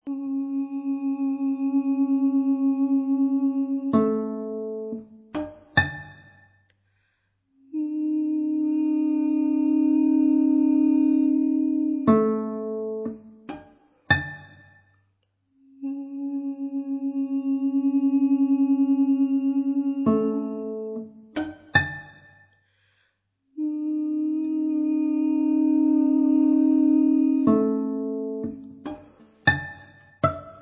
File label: Music for Cello